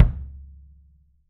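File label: wood kick, right; 3D Drums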